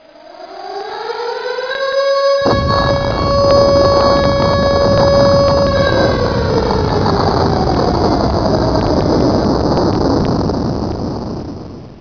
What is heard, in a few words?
missile